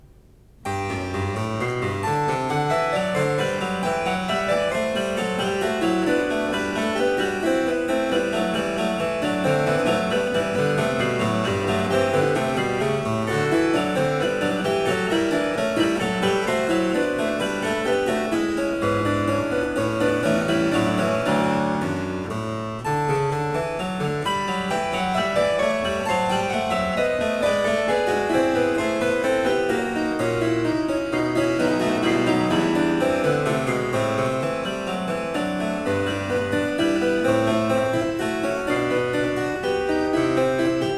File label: Cembalo; Variatio 6 Canone alla Seconda a 1 Clav; Bach; Goldberg Variations